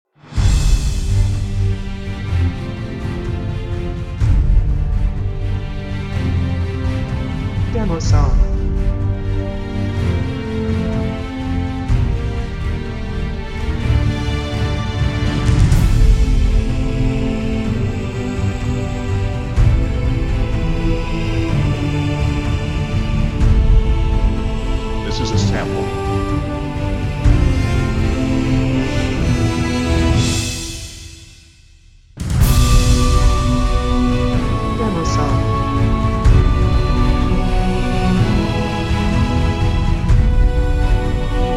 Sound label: Tales of Adventures and Epic Battles Choir Trailer Edition